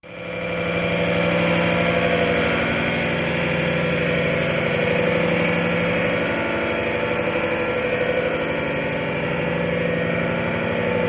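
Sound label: Steady State Machine Grind, machines winches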